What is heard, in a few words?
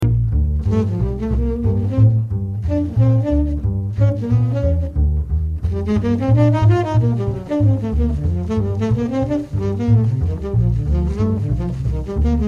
solo